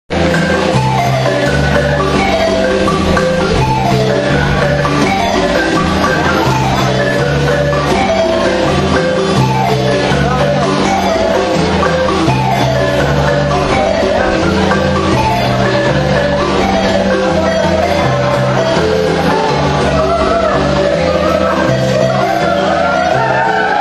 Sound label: maya music